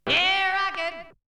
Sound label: Vocal; ZERO G - Datafile DISC 1